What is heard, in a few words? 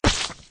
splort